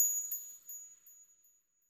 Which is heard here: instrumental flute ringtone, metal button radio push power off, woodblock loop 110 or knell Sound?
knell Sound